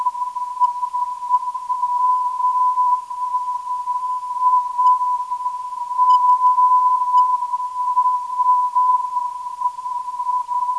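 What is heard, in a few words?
non-directional beacon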